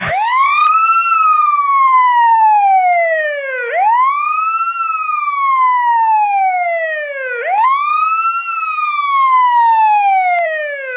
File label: Police Siren